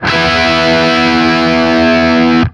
5TH E, Guitar; East West; Steve Stevens Guitar Samples Collection

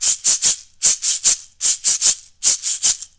MIRACA, Indian Traditions; USB Soundscan vol.45 - Indian Traditions; AKAI

